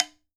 OKAWA, JAPAN PERC; AKAI S6000 CD-ROM; WorldSounds

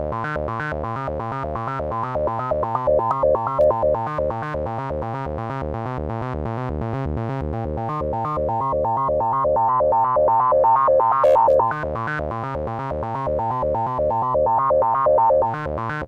126, SYNTH SEQ; AMG; Global Trance Mission VOL-2

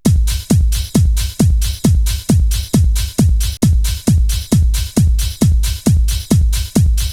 DRUM, 132; Megabass Remix! 2

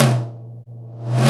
TOM, DRUMS; Analogon

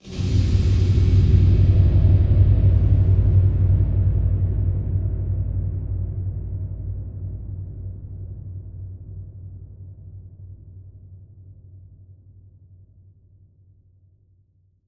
Huge Verb Tail, Long Reverb Tails; impact samples

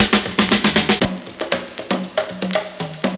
break; Zero G - Man Machine

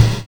JAZZ SOLO; Univers Sons - Kitch 70s

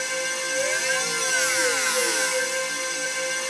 SYN, Drum & Bass, 160 BPM

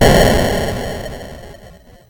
C64 Explosion; 8-Bit; Chiptune; Commodore 64; FX

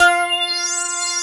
Digi goes; Best Service Gigapack 1

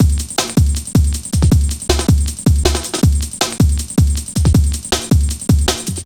Drum Loops, 158.5; Jungle

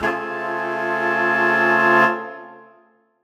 Horn Swell Amin6maj7; undercover samples

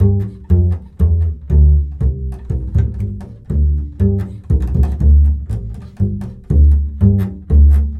Bull Fiddle, Appalachian

